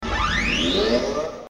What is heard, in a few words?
turbo; pepsiman